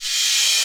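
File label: crash reverse, Dance Mega Drums; Best Service; Dance Mega Drums CD2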